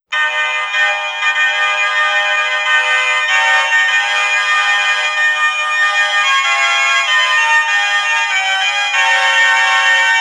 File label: specialty horns; stereo